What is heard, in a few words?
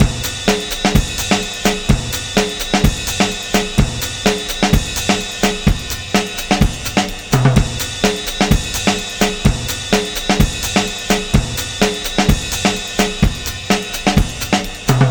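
drum 127, 127bpm, Breakbeat; Best Service ProSamples vol.40 - Breakbeat 2; Tommy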